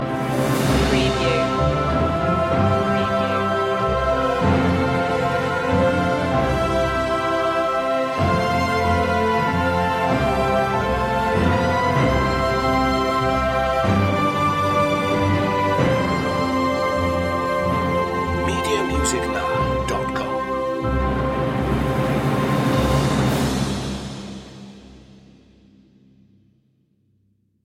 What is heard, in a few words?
orchestral loop end; the first noel; media music now